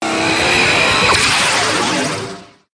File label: Bonus Trans; Temple of Luxor; genesis